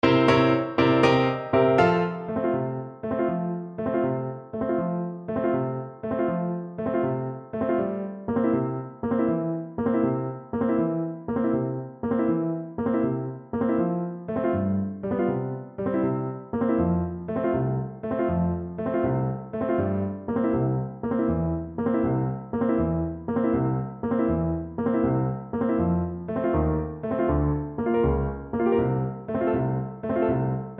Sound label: horn, French Horn